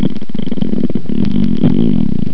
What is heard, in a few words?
frog